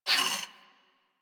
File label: Moa Leg Movement; Enemies; Warframe